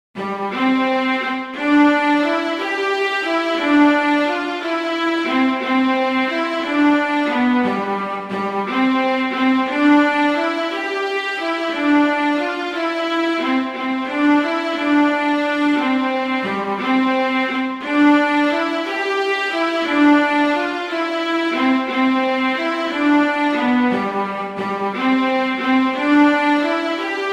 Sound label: I Saw Three Ships; Christmas Beginners